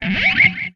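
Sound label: Music Guitar Pick Scrape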